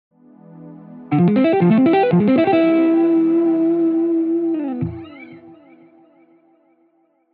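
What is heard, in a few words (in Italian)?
Esercizio 2, scala maggiore armonica di Do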